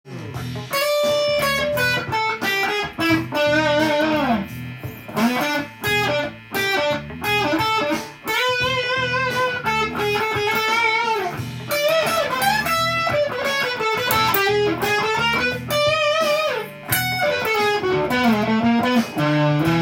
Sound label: e7 one chord solo